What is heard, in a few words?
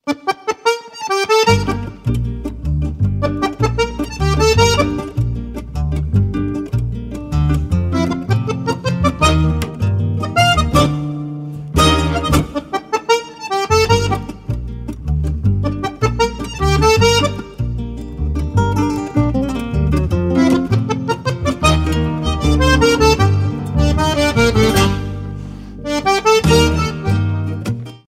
Tango